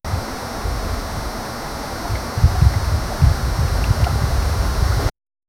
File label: nature sound of water and, breeze